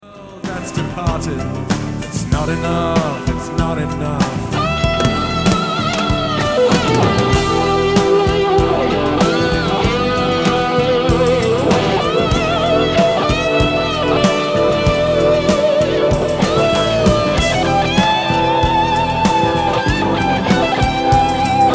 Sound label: First Solo Soundboard Radio Broadcast; Torino Soundboard; Earls Court Radio Broadcast